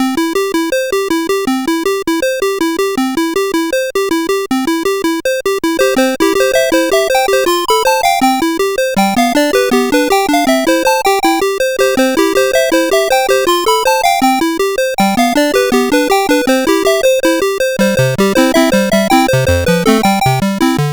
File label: BASIC, CSG8580R5